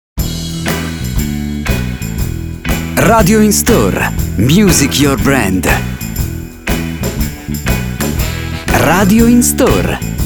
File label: Blues